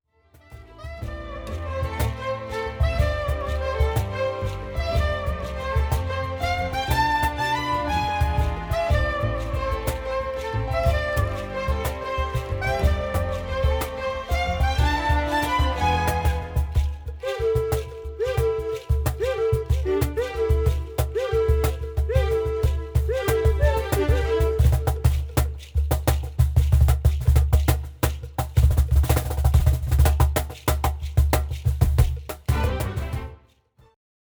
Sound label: Timba